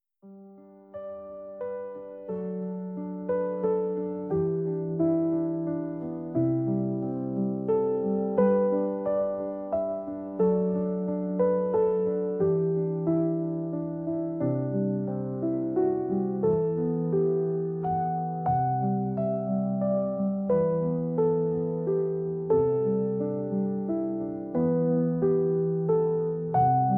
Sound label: Piano lullaby classic